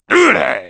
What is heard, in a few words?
jump; Klump